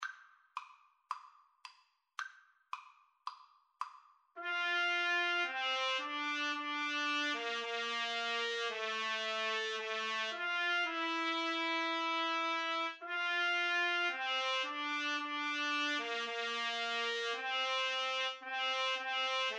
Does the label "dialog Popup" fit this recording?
No